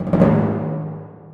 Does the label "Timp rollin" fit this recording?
Yes